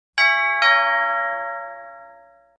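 Home Doorbell Rings Classic High Pitched at Close Perspective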